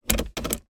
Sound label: car automatic gear stick move engine off daewoo nexia automatic